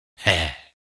panda small win; shangrila

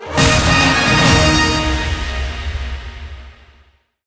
large win; rome

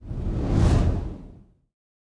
fire Ball Animation; elements

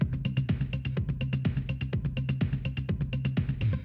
free spin music loop; spinsane

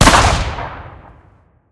floating Wild Appear; wild wild west

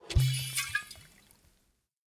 spin button click; golden tavern

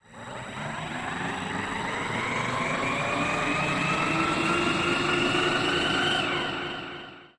blow up charge; elements

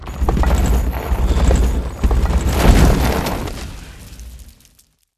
free Spin Expand; jungle spirit

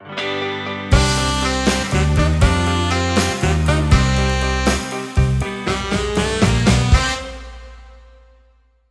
outro Free Spin; jackhammer 2

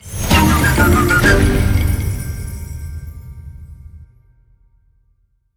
winLarge; templeofnudges

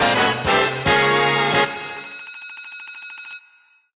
medium Win; piggy riches

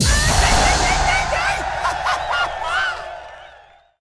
mega Win; colossal pinatas

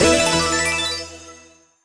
small Win; twin spin

